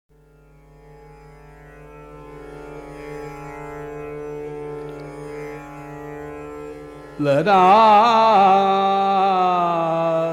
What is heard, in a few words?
Raga Shudha Kedar